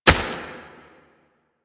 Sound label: Step Dirt, Footsteps